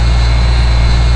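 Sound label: train loop; lander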